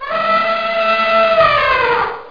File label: ELEPHANT